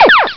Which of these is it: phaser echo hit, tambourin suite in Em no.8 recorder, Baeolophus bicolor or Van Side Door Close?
phaser echo hit